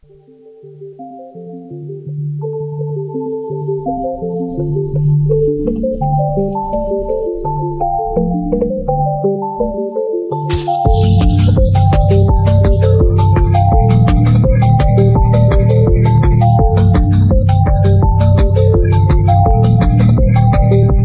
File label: awesome phonk song